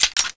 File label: M1014 Pull; cstrike; diliulsound